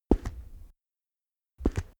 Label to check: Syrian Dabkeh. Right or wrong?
Wrong